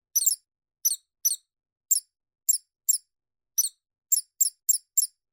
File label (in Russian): zemleroika